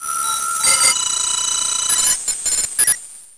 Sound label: comm up, sfx; darkfi